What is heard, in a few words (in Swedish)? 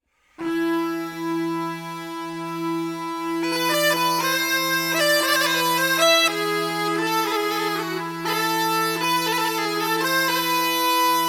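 Marsch Gånglåt, svensk säckpipa; Spilå Jans Gånglåt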